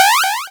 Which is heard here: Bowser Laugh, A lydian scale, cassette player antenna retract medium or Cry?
Cry